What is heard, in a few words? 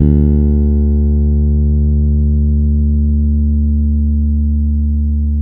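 D 3, warm fretless, bass; Spectrasonics; Bass Legends CD 1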